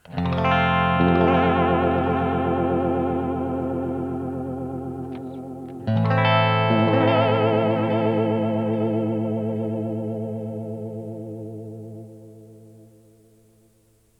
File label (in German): Delay mit Vibrato Uni Vibe; TC Electronic 2290 P